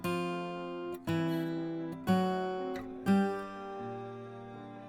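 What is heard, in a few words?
guitar A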